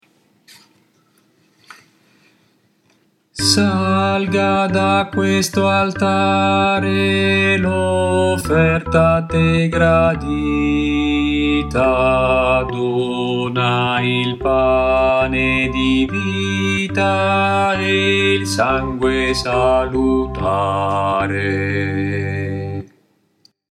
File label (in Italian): bassi